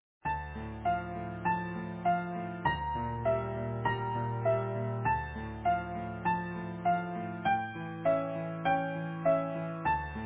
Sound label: Easy Piano